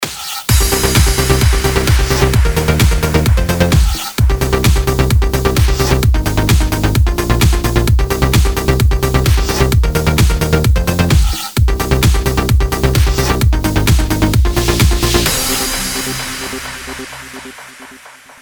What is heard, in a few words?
Electro Dance Logo